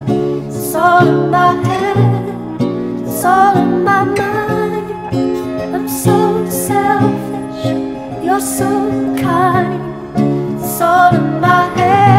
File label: sped up reverb